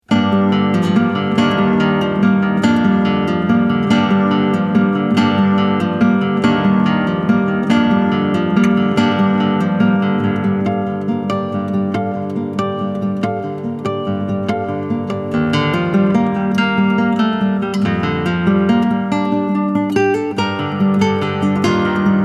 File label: Dark Waltz